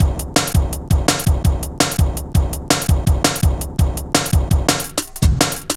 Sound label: Bouncy, Jungle, Drum Loops 166.5